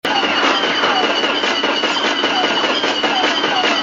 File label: Lasers; Xwing